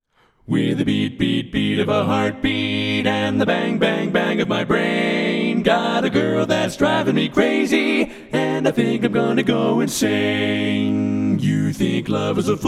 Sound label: balanced voices